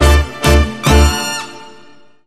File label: Hit